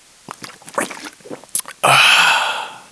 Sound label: whiskey